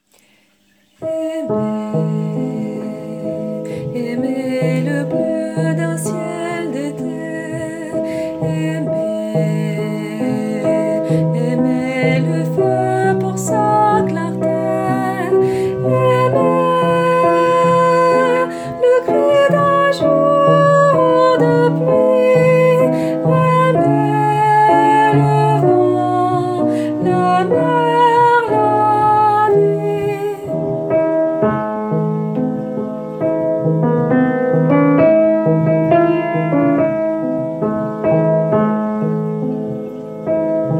Aimer ténor

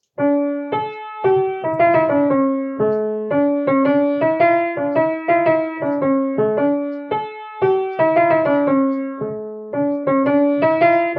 Polska soprano; Soprane

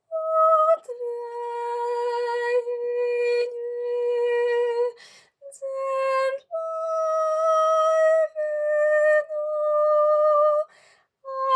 Vejini tenor; Jurjaanu a puut veejinji; letton

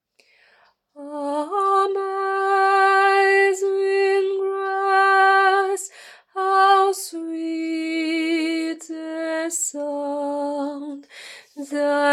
Amazing Grace alto

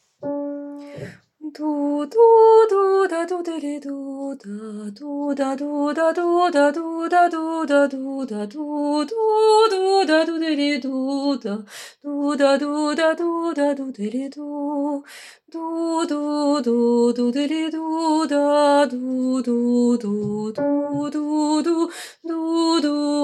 Polska alto; Alti

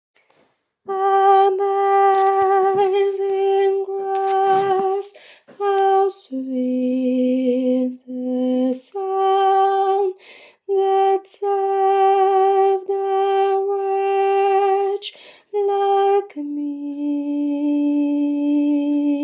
Amazing Grace tenor

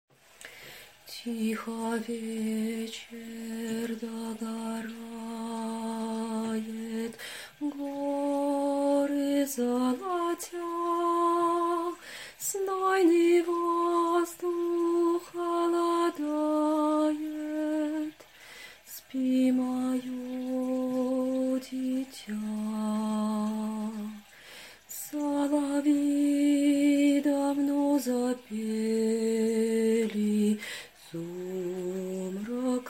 Sérénade alto

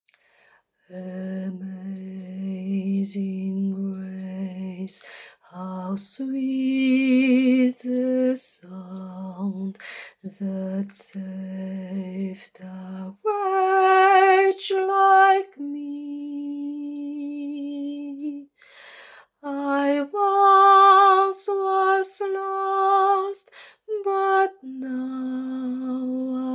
Amazing Grace basse

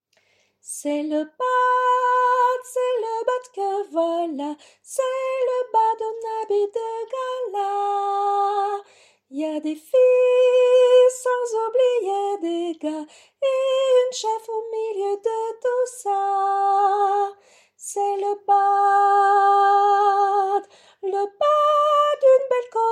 C'est L'Aubade soprano; Soprane

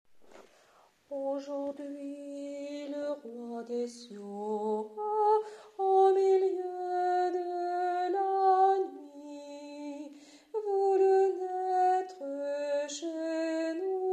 Aujourdhui le Roi des cieux basse

